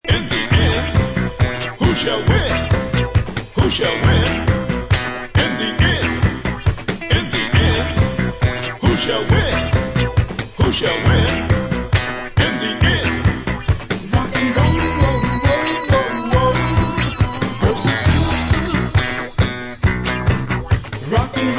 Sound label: Rock & Roll vs Disco